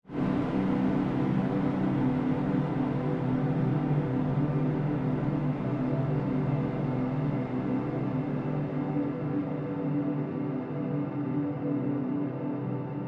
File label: Created Pulse Fade Long, Rise And Dive Sound Effects; sound building blocks created through manipulation of raw material